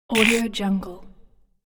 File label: short pain critter monster voice